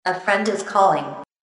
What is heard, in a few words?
Melody, RingTune